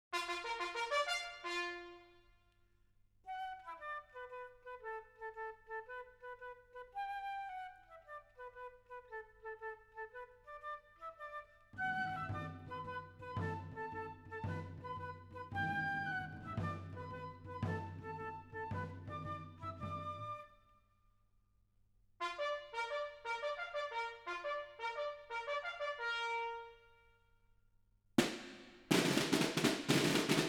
Bugle, Fife & Drum Calls

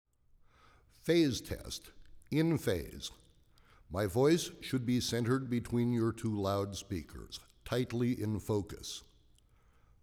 Relative Phase Test; SHEFFIELD LAB - The Sheffield - XLO Test & Burn-In CD

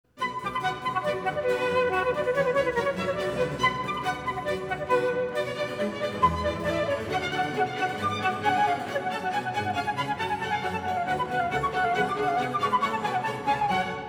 Orch. Suite no. 2 in B minor - Badinerie; James Galway

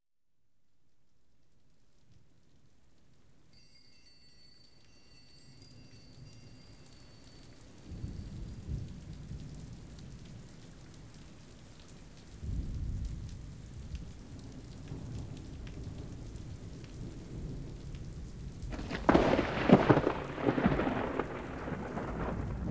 Surround Demonstration; Denon Jazz Sampler plus The Audio Tool Box

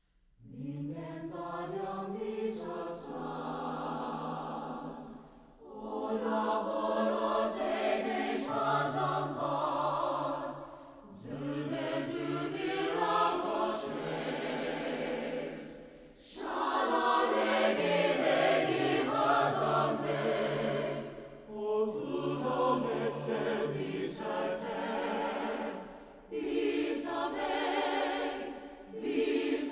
Hungarian Folk Song

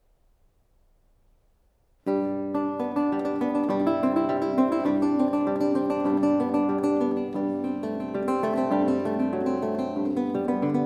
Lute Suite in A Major I. Prelude; Jakob Lindberg

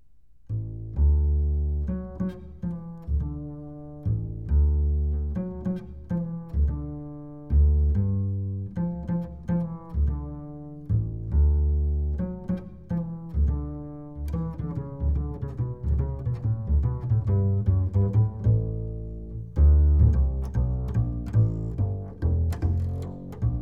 Bass Resonance Test

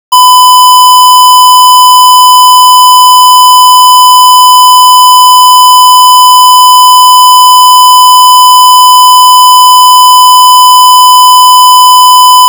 1002.3 Hz Squarewave (Digitally Generated)

